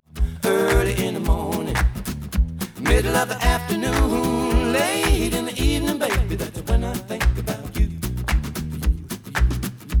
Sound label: noised; 4bit